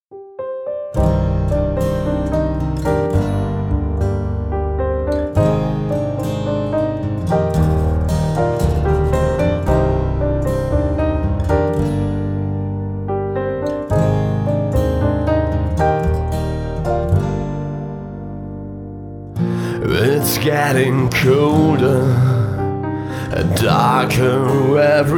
acoustic version

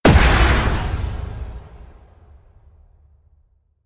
hit big biological weapon

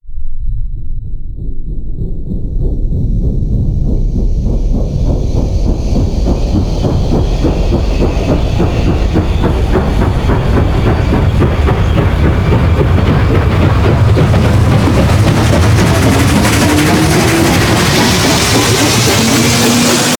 riser pain train

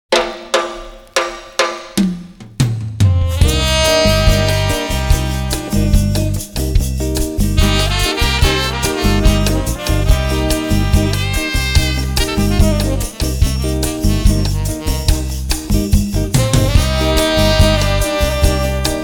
bossa interlude

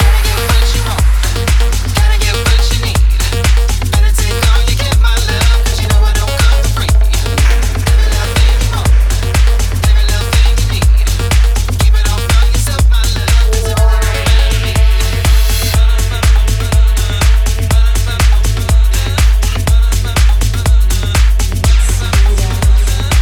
deep house techno

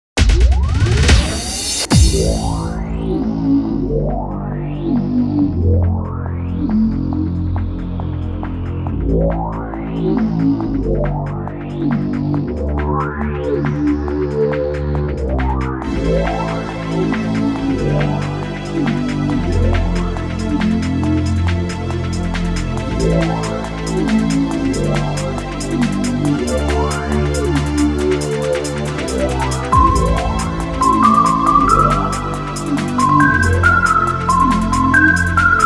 extended vocal club mix

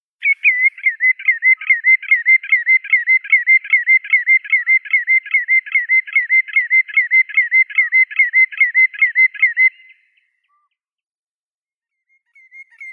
ulit bolshoi tringa nebularia

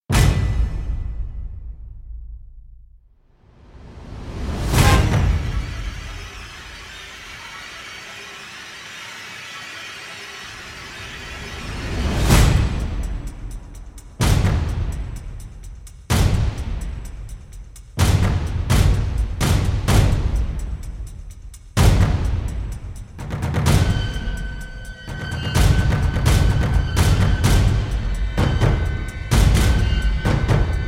drums fx

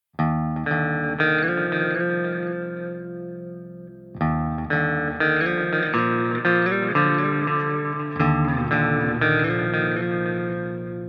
instrumental

